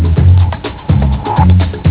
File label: music